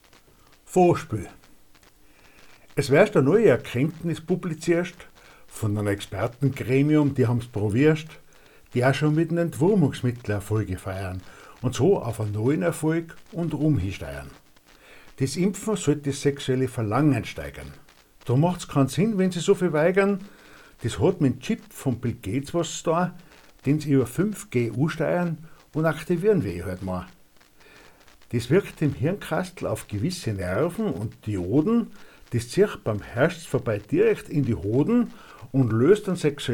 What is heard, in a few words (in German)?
Vorspiel